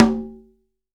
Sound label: CONGA, STEREO CONGA